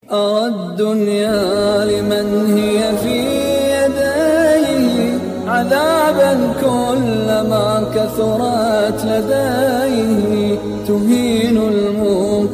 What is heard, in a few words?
islamic